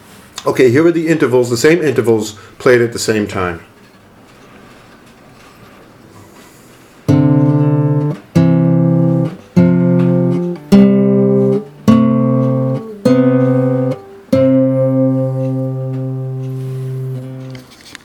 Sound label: intervals, classical guitar